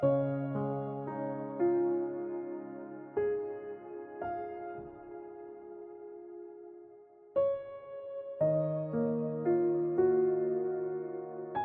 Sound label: Baby Lullaby